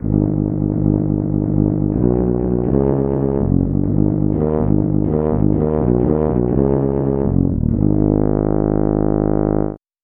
trumpet bass

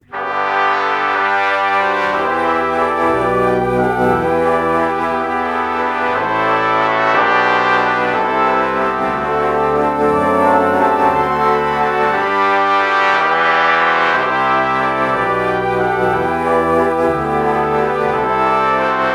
alto flute trumpet morph

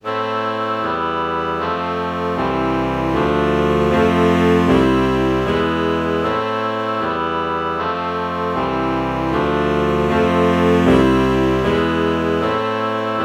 alto saxophone oboe chord morph

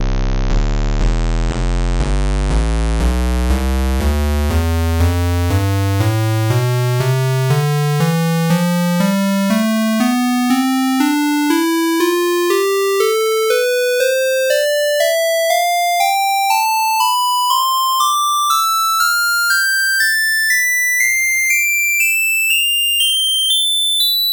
square lead tonleiter

